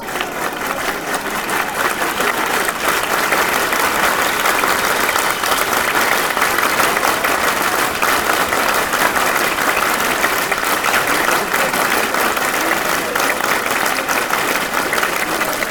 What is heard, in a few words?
clapping chant